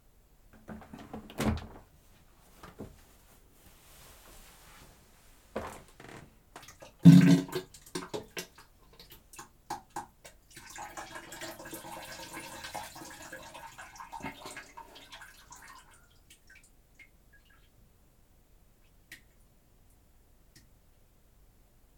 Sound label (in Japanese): 放屁放尿脱糞音声集(きばっている音も含めたロングバージョン)